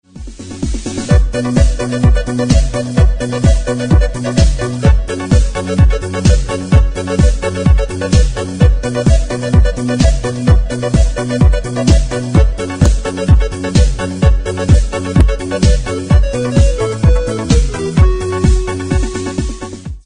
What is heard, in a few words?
instrumental